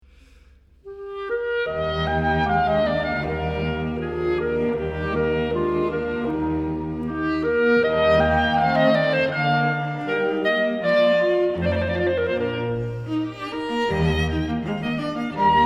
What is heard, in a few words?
Clarinet Quartet; Quartet for clarinet and strings in E-flat major; Hummel; 4th mov; Festival Montalcino 2008